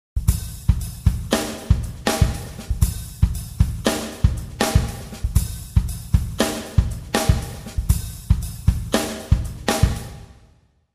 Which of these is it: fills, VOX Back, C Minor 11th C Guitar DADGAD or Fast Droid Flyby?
fills